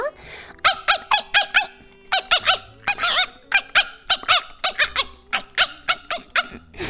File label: chihuahua